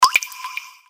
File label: message, notifications; Tecno P3